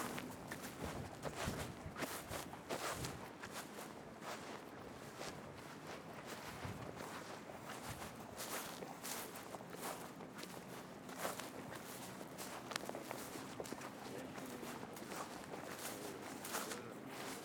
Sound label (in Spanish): Pasos Sobre Hierba